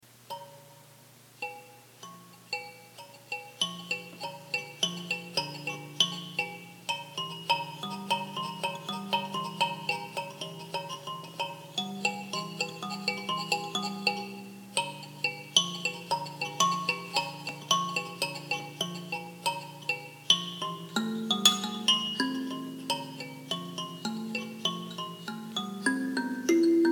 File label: kalimba ramble